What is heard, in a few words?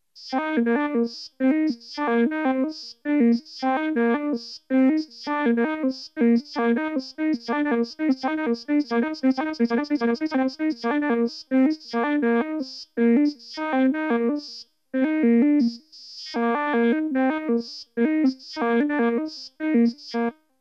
Vocorder